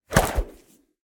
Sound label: Swoosh Rope Whip; Just Whoosh 3; soundbits